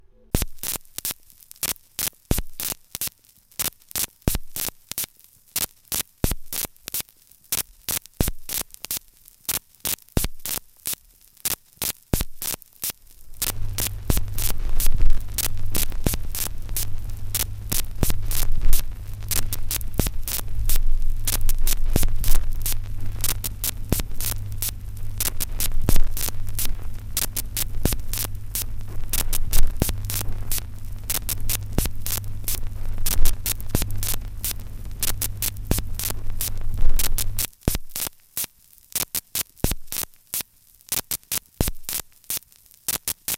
Rua da Alfandega, Lisbon; Fieldrecordings

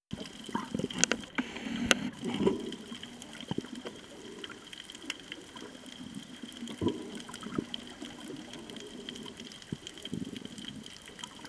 Tanote Bay & ride, fieldrecording, Koh Tao; Original Video Ton; 19. Dezember 2019 - 6. Januar 2020 Bangkok - Koh Tao (Fieldrecording Audio, Foto, Video)

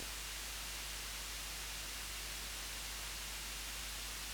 noisebeat; Samples Rauschen

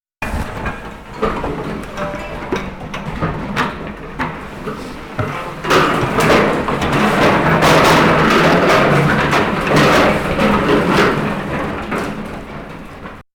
Beats; HomeRec Mix; MD Instalation K 2

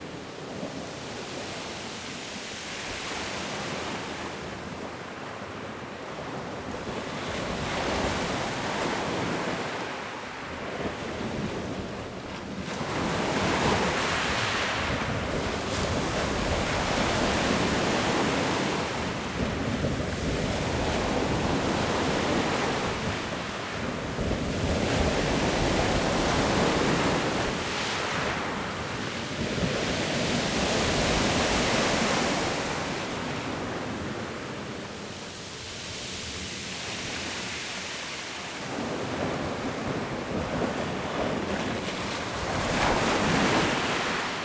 Playa Forti; Rode NT 4; Curacao; Fieldrecordings

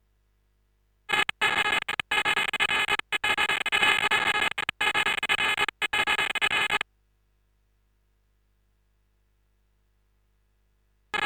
stereo instr applaus loop

